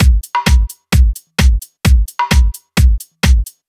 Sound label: Drum Loops, 130 BPM, Underground UK House & Electro